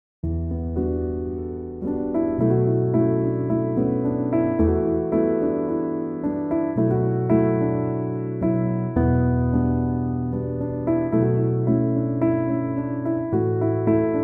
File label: piano instrumental